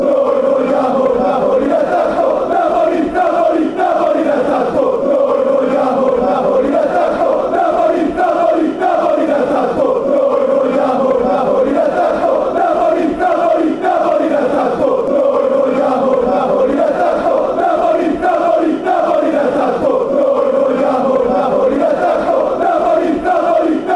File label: attacking, chants